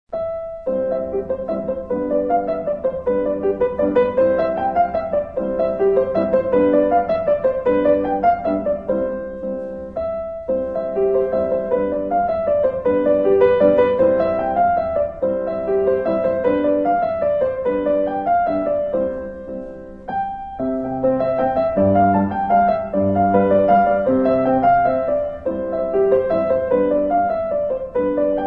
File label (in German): Walzer